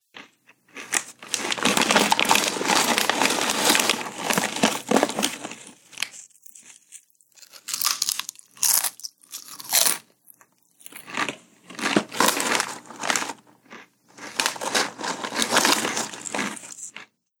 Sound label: Reaching Into Bag And Munching Potato Chips; Eating